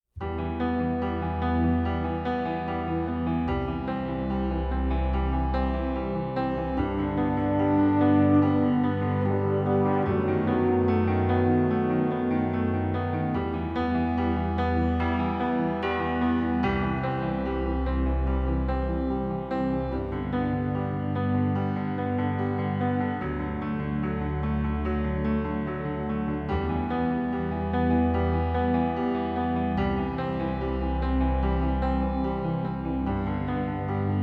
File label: I Heard the Bells Accomp